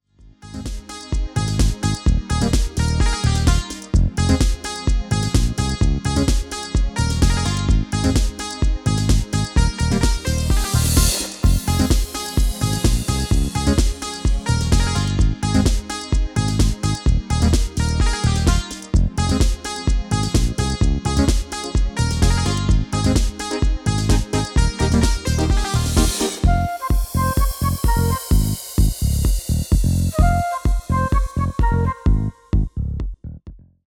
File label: MIDI